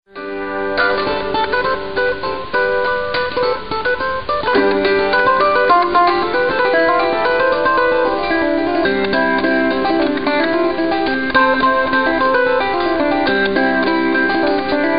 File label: valiha